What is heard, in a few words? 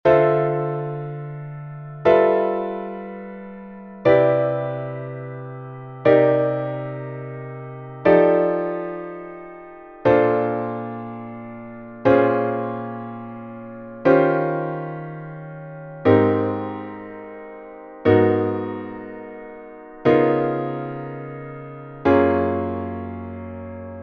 II-V-I Descending Steps, Chords